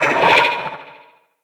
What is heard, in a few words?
Corrupted Slug Vocal Pain, Creatures